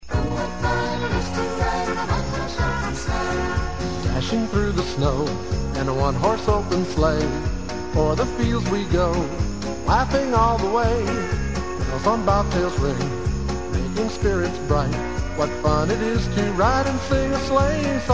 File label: Jingle Bells